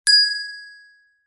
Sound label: bell small hand ring ping single soft